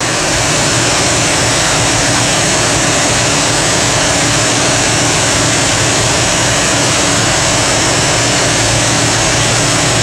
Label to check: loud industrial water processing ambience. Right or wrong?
Right